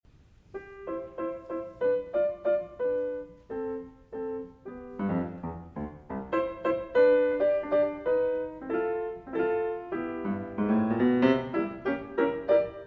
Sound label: Jazz Solo Piano; A Berlino, a marzo del 2009